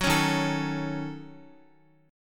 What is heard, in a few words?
Eb Minor 7th Flat 5th, down, Guitar; strummed